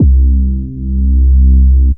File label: New Old Sub, Bass; Ableton; Factory Packs; Chop and Swing